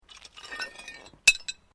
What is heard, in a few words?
Glass move; Material components for constructing more complex sounds; Materials